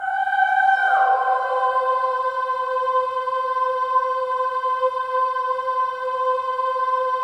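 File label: Voices, INTERVALS, 5TH DOWN; Spectrasonics; Symphony of Voices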